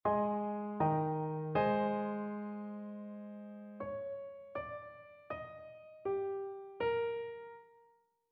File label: melodic fragments